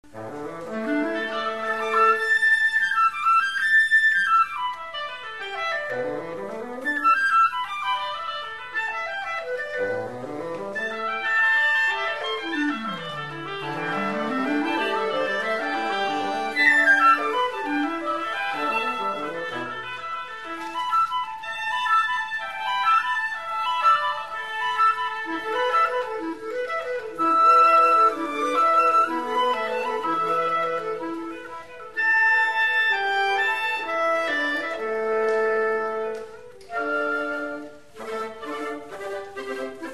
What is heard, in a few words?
woodwind scherzo